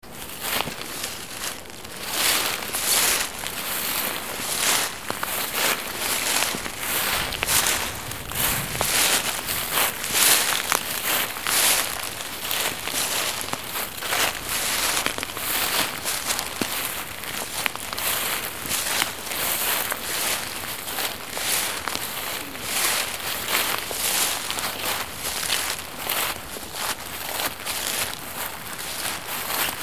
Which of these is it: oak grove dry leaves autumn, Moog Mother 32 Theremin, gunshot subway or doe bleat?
oak grove dry leaves autumn